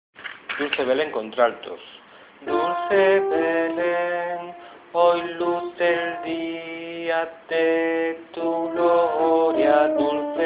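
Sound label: alto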